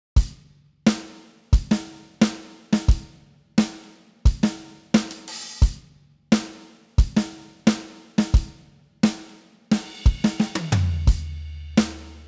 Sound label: pop drums